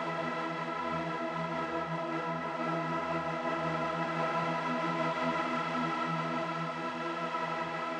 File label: Drone; stereo toolkit samples; Non Tempo Loops